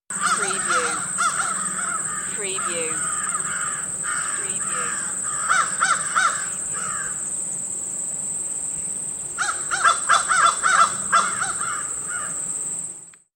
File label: Crows